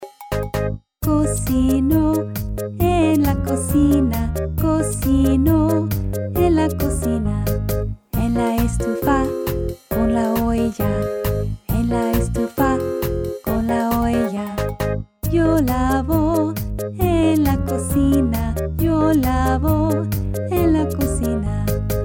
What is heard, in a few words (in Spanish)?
cocino en la cocina, Spanish Educational Music, Song